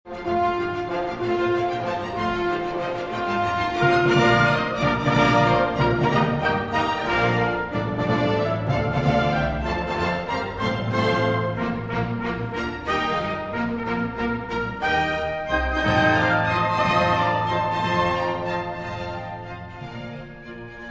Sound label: Symphony No. 5 in D minor, Allegro vivace; Reformation; Op. 107